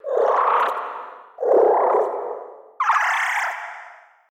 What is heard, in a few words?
spirit fox chitter (cute)